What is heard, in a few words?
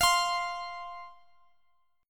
F 5th F Guitar Standard; strummed; chord; x,x,x,x,18,20; JGuitar